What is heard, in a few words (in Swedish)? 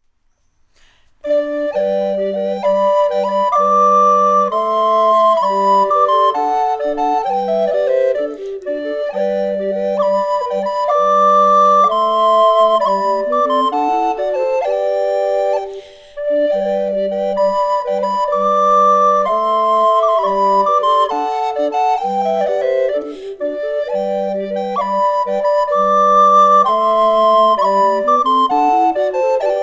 malungs brudmarsch, recorder